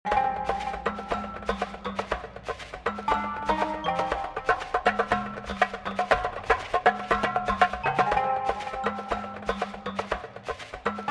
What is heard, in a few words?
Puzzle Game Music; Zoo Tycoon 2 Extinct Animals; Radio